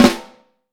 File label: FLAM, Vintage Snare Drum; Q Up Arts; Johnny.C's Vintage Snare Drum; 5.5X14 SLR